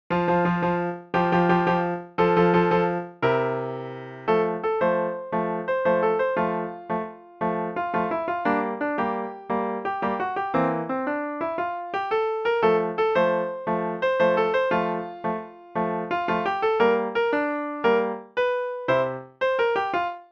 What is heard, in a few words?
Fanfare